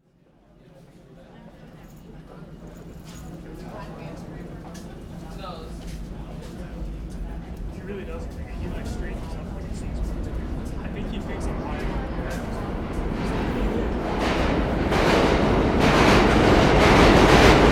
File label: old subway cars